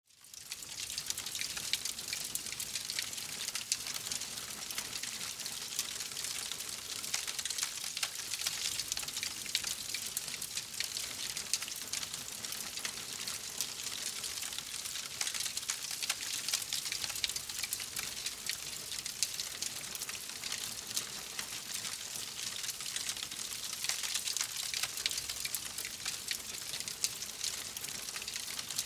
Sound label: fire fireplace crackle